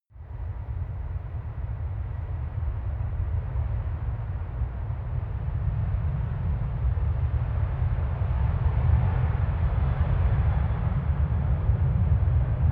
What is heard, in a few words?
plane flyby land rumble